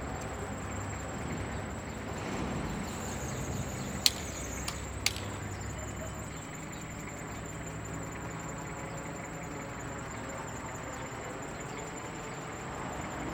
AMB EXT MANANA CIUDAD Barrio Molino Blanco Rosario ARG Generico transito

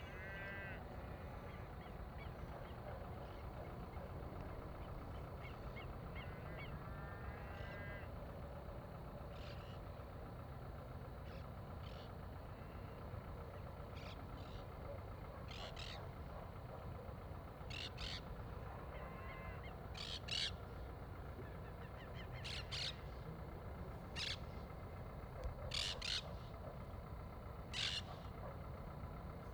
AMB EXT ATARDECER CAMPO Trinidad UY Tractor

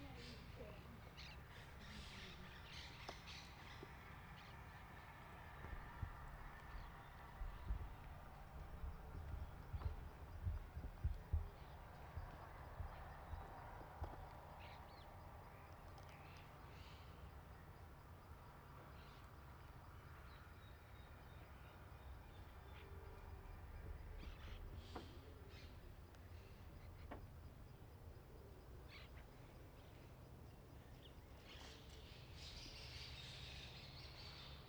AMB EXT MEDIODIA VIVIENDA RURAL Flores UY

